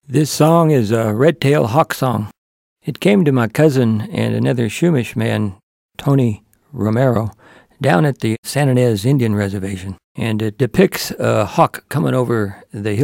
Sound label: redtail hawk song story